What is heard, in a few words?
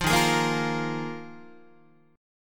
D sharp Suspended 2nd Suspended 4th D sharp, Guitar; strummed; chord; 8,11,11,8,9,9; JGuitar